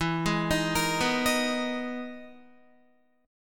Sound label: E-Major Sharp 11th E, Guitar; arpeggiated